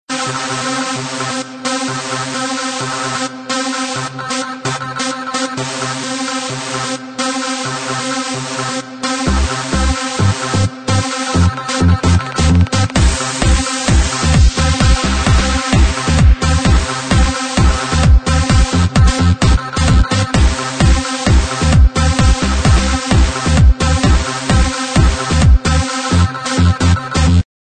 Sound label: Remix Techno Ringtone